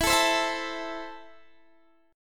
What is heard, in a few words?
E Suspended 4th Sharp 5th, Guitar Standard; Esus4#5 strummed; x,19,19,17,17,17